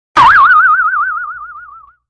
comedic spring

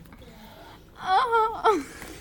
ahh

